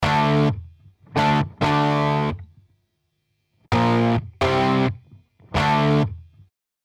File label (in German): E-Gitarre Dist TUBE CULTURE Tape Settings